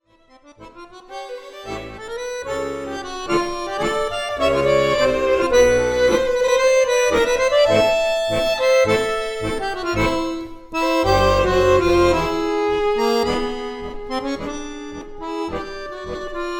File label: Tango